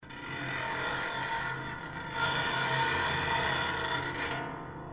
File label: Piano